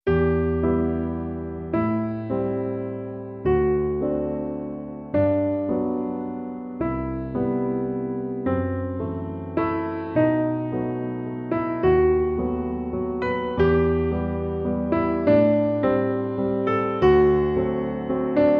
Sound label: Piano Only